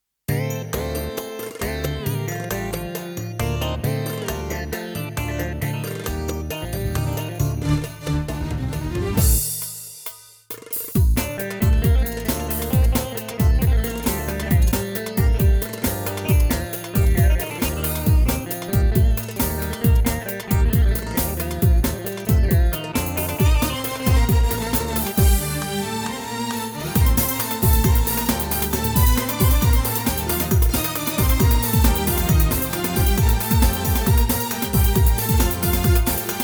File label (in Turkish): 4 4 karma